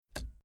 Car Classic Bentley Eight Dashboard Indicator Lever Flicked Down; Transport